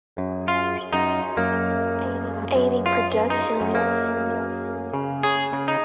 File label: Instrumental